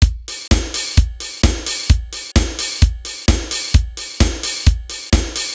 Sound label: acoustic